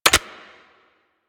Arch Gun Primary Beam Mech, Weapons; Warframe; Archwing; Hildryn

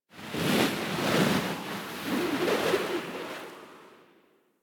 Wind Gust Large; Ambience; One Offs; Warframe; Eidolon Plains

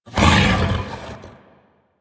Melee Grunt D; Enemies; Vocals; Warframe; The New War; Archon; Amar

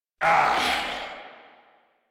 Death, Grineer Male

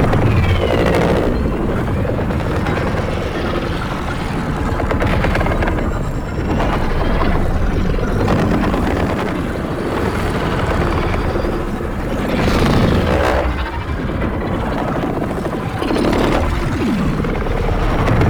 Train Time Machine Loop; Warframe; Ambience; Entrati

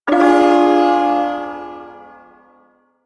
Shawzin Min Chord; Warframe; Duviri Ersatz Shawzin

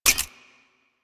Security Camera Motor Stop; Objects

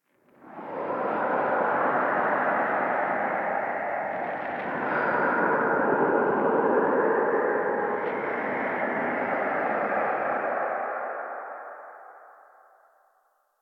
Whispers Dark; Warframe; Ambience; Entrati

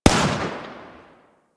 Heavy Pistol Shot 3D; Warframe; Eidolon; EidolonGrineerMarinePistol

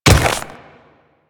Ice Step, Footsteps; Royal Guard

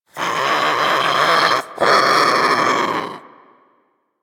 Sea Bird Vocal Snore; Warframe; Hunting Critters; Ostron Sea Bird

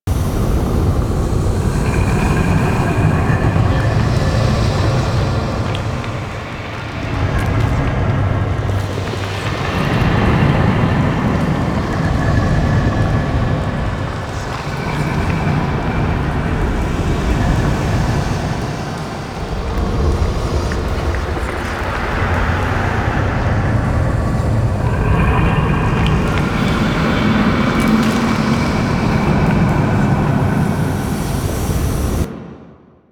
Moon Interior Ambience; Warframe; Orokin Moon

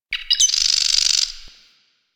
Wren Bird; Warframe; The New War; Zariman; Apartment

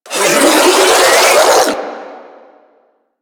Melee Mid Spin Scream

